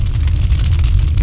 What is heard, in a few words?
flames